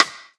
trebly snare single hit c sharp key

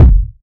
clear kick drum sample f sharp key; kick drum samples

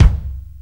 bassy kick single hit c key

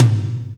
high floor tom single hit a sharp key; tom drum

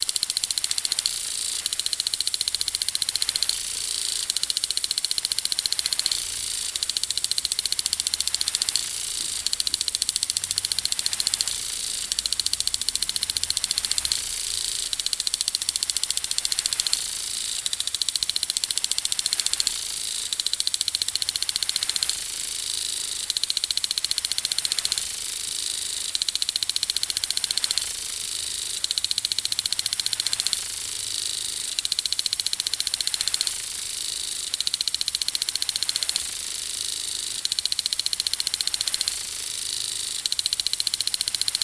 plastic sprinkler

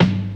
00s rich high end bass drum one shot g key; Duration 0:01; Samplerate 44100 Hz

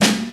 perfect snare sound A key

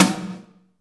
big room snare single hit f sharp key

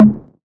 tom drum single hit G sharp key

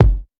raw kick drum single shot F sharp key

